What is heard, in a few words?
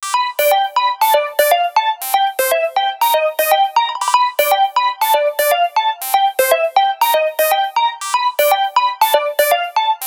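Pinball ringtone; PlusFourSix; SE Sound Project; Lars Edvall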